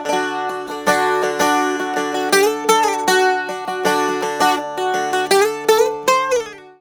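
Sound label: tzouras, D; TSOURA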